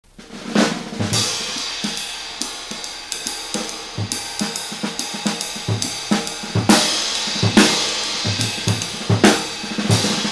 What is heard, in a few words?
jazz